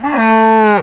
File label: Cow Die; Red Alert; RA2 Allied; Desktop Themes